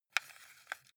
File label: console controller xbox joystick move